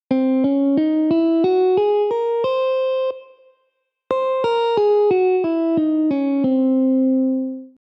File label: Superlocrian; scales